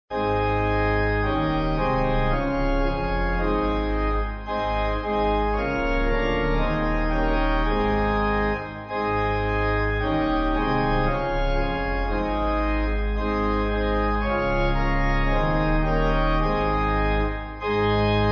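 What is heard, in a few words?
Pipe, pipe organ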